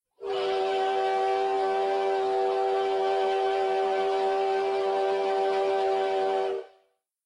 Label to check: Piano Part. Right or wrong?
Wrong